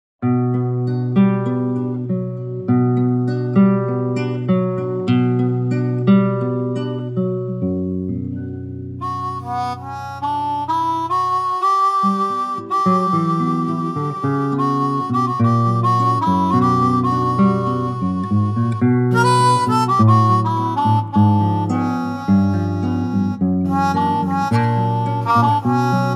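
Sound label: gtr armonica, B minor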